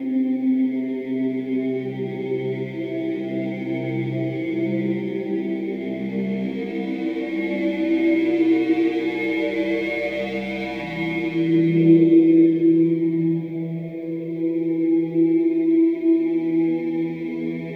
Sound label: Clan Dojo Hall Amb; Warframe